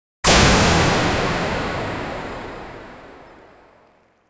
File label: short sound effect, retro game